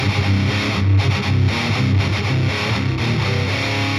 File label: Guit; Metal Zone 13